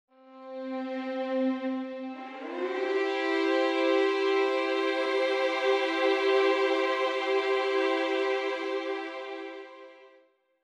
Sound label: VI solo LASS vib